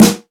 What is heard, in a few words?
original snare drum sample g sharp key